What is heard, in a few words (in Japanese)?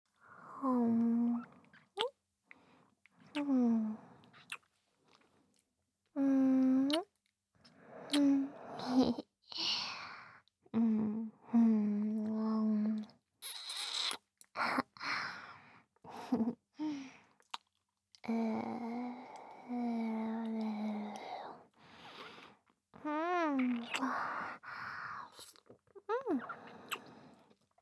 ディープキス; アリサ